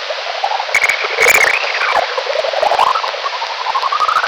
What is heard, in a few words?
Lesser horseshoe, bat; Jon Russ